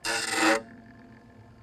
warn; AssaultCube; Alien Stars; American Muscle Series

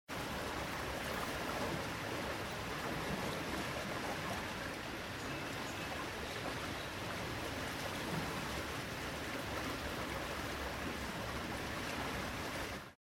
Harbor; Age of Empires III

